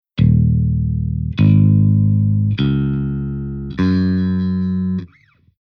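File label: Bass Tuning Half Down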